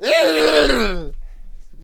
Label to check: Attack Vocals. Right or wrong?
Right